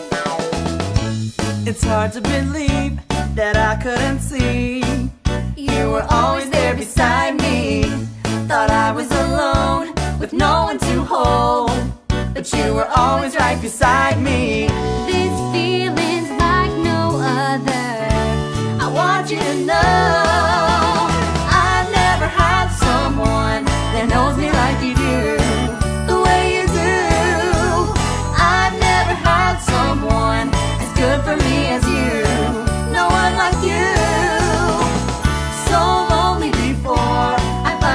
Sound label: guide vocal